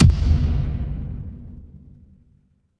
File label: transition impact hit